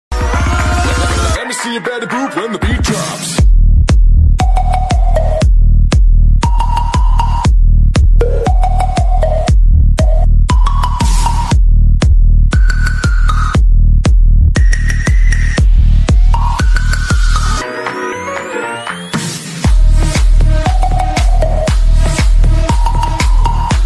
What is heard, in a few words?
Mobile Ringtone